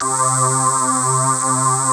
VOX PULSER; Pro-Rec - Synthsonic; BASSES

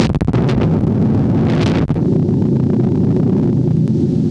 thunder hit, spacey drums; Spectrasonic Distorted Reality 1

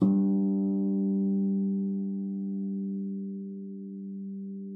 FLAG F#3, harp; Xsample VOL-8; HARP+FX

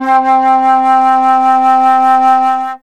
flt C3, Flute, Classic Orchestra; Best Service ProSamples vol.51

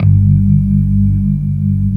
G1, Keyboards Old School; USB Soundscan vol.09; AKAI

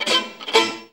VIOLIN HITS; Best Service; World Colours

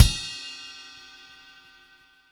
CymKick, Latin Percussion; Sonic Foundry (Sony Creative Software); Joe Vitale Latin Percussion

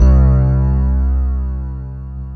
E-RHODES; Best Service Advanced Media Trax Modern Composer

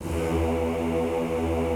E1-L, Classical Choir; Best Service; Extended Classical Choir

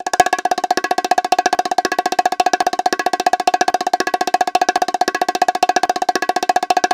BONGO ROLL; CUBA

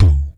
VOC DRUM, MOUTH PERC; Best Service Hyper Dance

